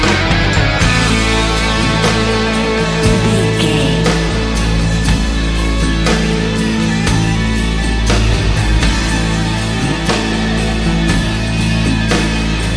Sports Rock, Pop Rock, Rock, Hard Rock, Metal; NFL; Football; Sports Music; Driving; Bikers

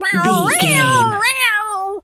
cat scream

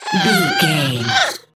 goblin fairy effort push

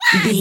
voice fun creature small mutant voice emotes

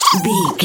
goblin fairy attack special

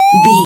retro alarm siren loop

